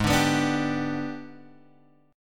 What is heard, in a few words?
G sharp Minor Major 7th Flat 5th, Guitar, Open G; 6,7,9,7,8,x